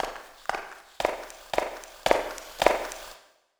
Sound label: concrete, footsteps; 1000fx